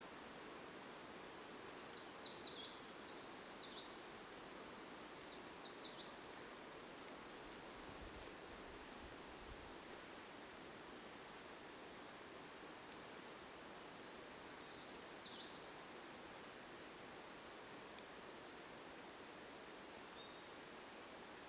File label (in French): grimpereau des bois; dmitry kulakov